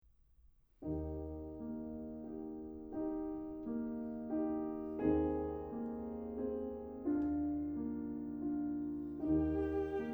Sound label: piano trio in b flat; schubert